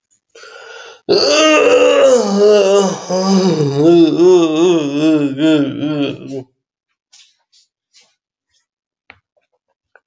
Human sounds Human voice Yawn, vocal imitation

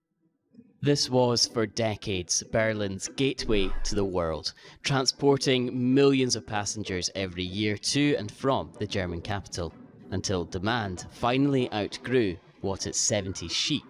Vocals

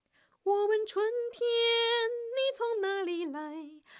Soprano

